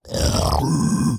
猪灵蛮兵愤怒声

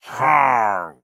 待机声; 掠夺者

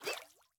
美西螈空中待机声

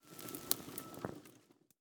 宝库环境声; ambient

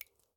滴水石锥水滴落声

